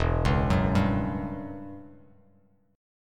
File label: E Minor 6th Add 9th E down Bass Standard; Em6add9 arpeggiated; JGuitar